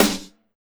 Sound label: Snare